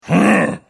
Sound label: Hit